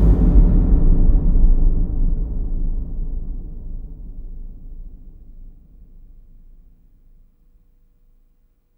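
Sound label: DISTANT DRUMS, AMBIENT DRUMS; Spectrasonic Distorted Reality 1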